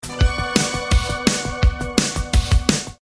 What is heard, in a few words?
notif